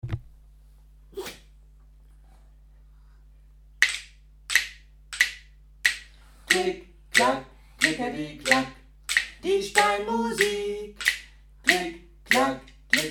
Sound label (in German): Klick klack